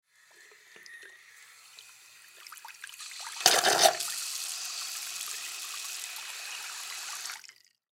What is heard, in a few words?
Ship Sink Broken Water Spray Hard; Transportation Watercraft; Boat Sound Effects; Boats (Sound Effects:Vehicles); Blastwave